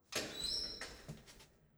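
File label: ambience generic sounds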